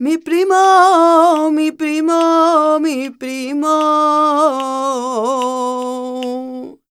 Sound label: voc c, Flamenco, Vocals, Female Cantaora